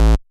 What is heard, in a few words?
bass